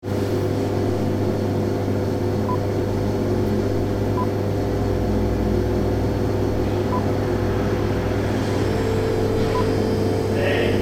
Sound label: Garage Waiting Room Interior